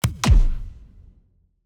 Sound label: heart damage kick